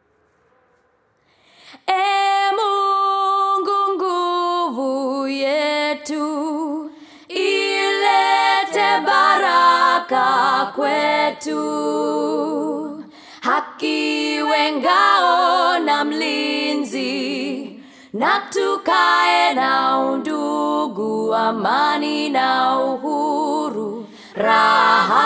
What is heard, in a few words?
KENYA NATIONAL ANTHEM